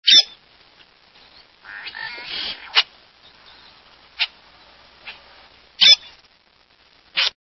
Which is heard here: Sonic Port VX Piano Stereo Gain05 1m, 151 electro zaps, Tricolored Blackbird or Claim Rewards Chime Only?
Tricolored Blackbird